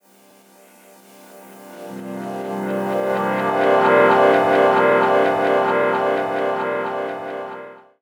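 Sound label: Pad Synth; Equipped Music; Loungin House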